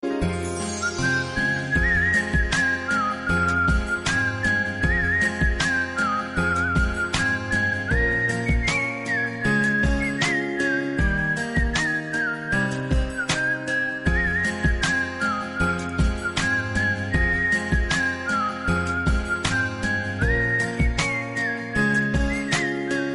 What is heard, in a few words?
bangla